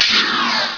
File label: throw; Baraka